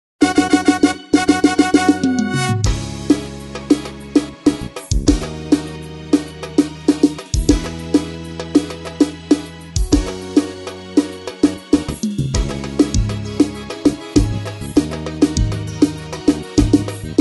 ritmos e samples

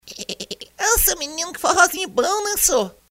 vinheta forró

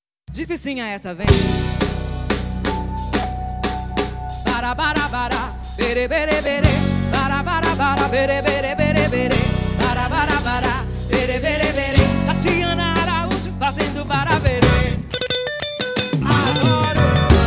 ensaio

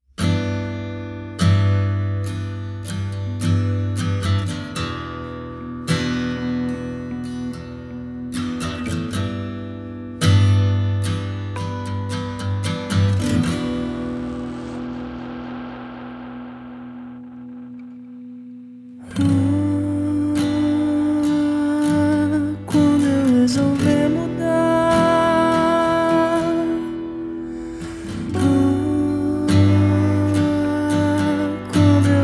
acustico

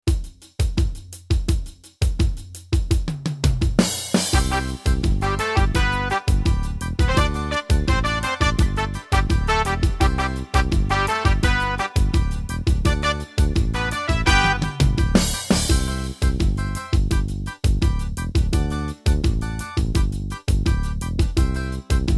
ritmos yamaha pagode; pagode sp

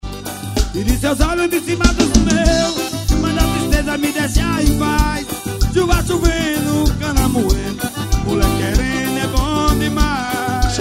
acordeon

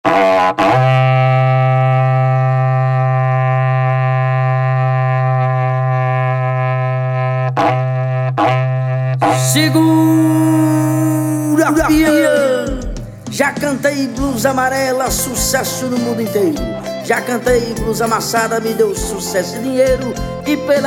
abertura com berrante

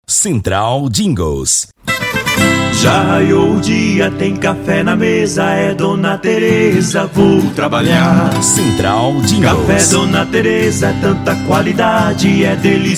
jingles

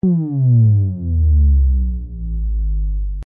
aviao descontrolado pad buuummmmm

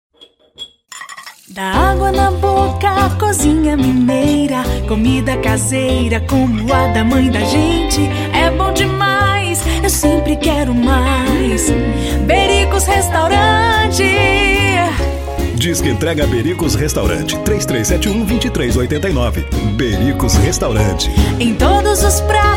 jingles

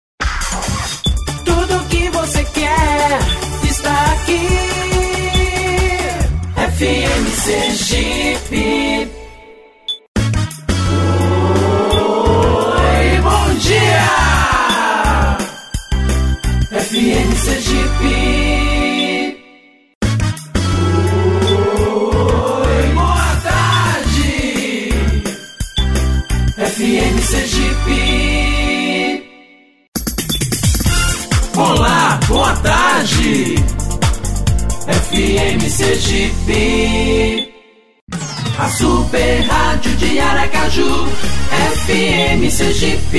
vinhetas jingles

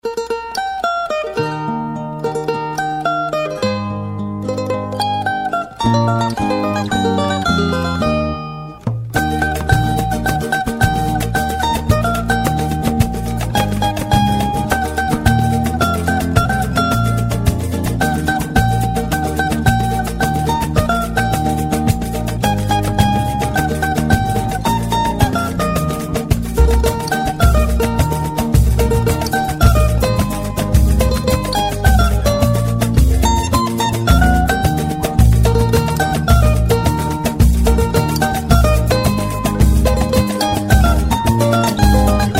pagode jingle bells cavaquinho

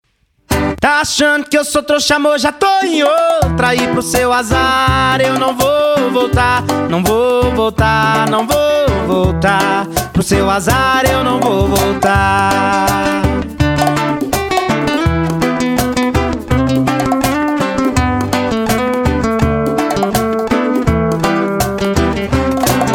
sertanejo

